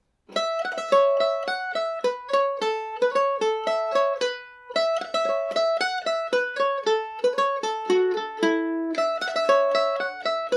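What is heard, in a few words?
polka, A major, irish mandolin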